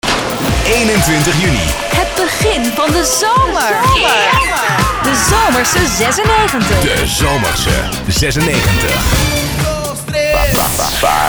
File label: 125 bpm, branded intros